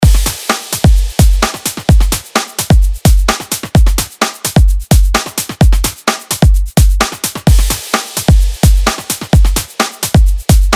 drum loop breakbeat 129 bpm

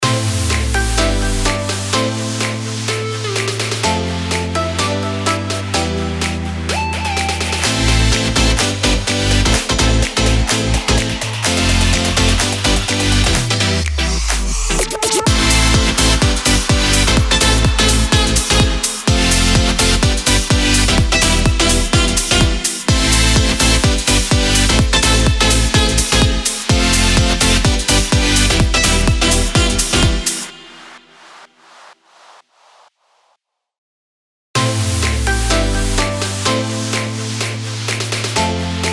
FUTURE HOUSE AMIN 126BPM; BED

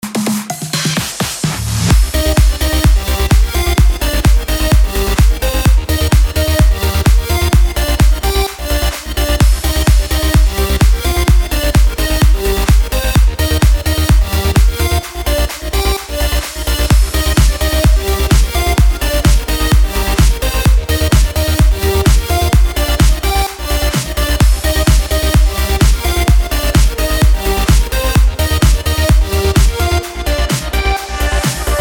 ELECTRO HOUSE CMIN 128BPM

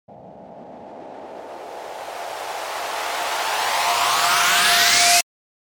RISER

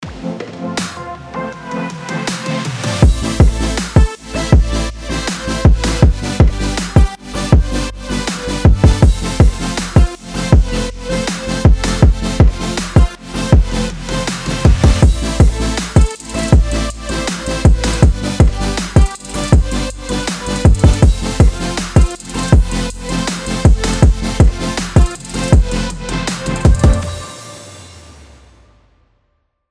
FUTURE BASS AMIN 128BPM; BED

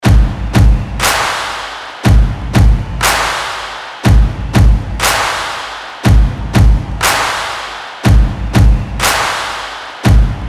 DRUM LOOP BOOM BOOM POW 120BPM

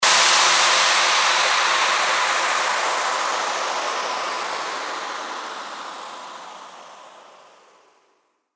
WHOOSH

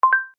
SFX OKAY GOOGLE SOUND

